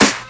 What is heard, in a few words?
SD, Dance Mega Drums; Best Service; SD-HIP